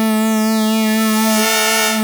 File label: OB 18 A4, Rare Synthesizer; Hollywood Edge - Giorgio Moroder Rare Synthesizer Collection; OB 4 VOX.